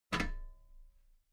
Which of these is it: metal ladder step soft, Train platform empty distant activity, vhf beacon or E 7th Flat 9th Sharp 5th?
metal ladder step soft